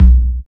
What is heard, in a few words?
DR. LOOP, DRUMS; X-Static Goldmine 3